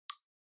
Smartphone UI Sound Speaker

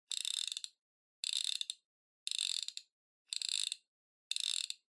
Stopwatch Mechanical Wind Up

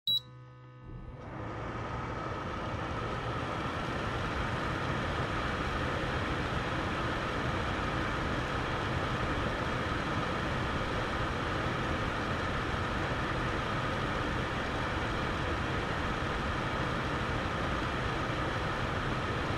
Air Purifier Mid

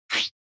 Motion Agility Short

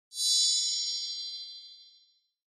Horror Accent High Short

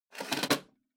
Public Phone Coinbox Close

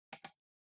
PC Mouse Far R

